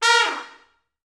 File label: Pop Brass; Best Service; ProSamples vol.19; AKAI